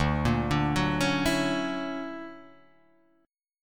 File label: D Augmented Major 9th, Guitar, Open D